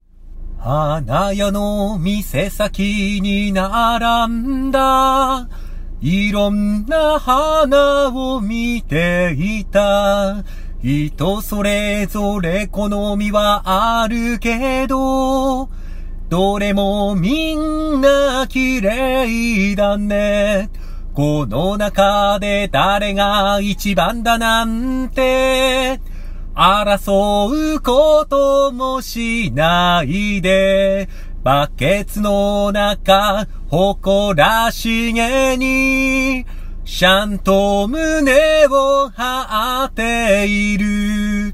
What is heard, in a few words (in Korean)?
일본남 노래